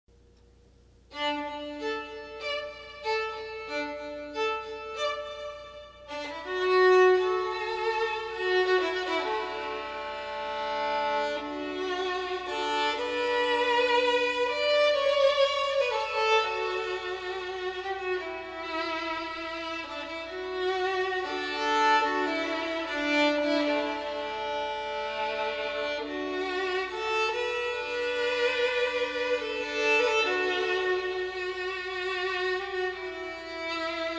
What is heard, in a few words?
Violin Solo